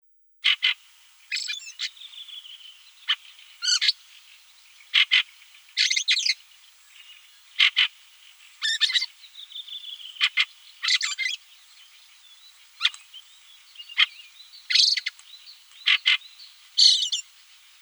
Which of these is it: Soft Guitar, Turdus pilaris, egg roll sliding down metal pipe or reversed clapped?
Turdus pilaris